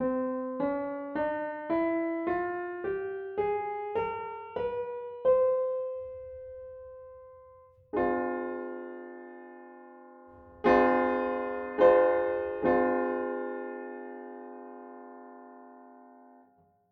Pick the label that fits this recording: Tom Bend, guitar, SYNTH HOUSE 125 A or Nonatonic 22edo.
Nonatonic 22edo